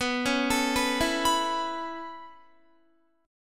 B 7th Suspended 2nd Suspended 4th down Guitar, chord; B7sus2sus4; arpeggiated